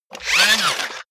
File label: robot movement fast short